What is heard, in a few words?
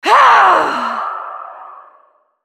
ultimate effort; Lissandra